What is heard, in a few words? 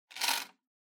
Public Phone Coinbox Noise